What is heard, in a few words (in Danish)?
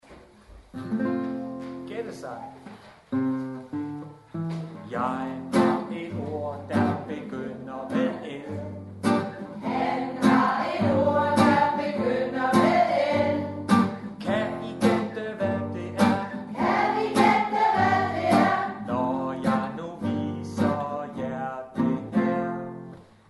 Sang